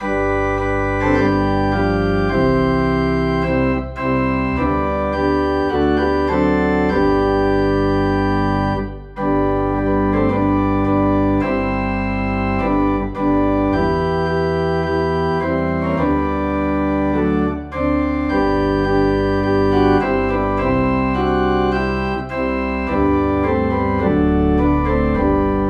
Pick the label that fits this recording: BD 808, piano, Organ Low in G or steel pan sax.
Organ Low in G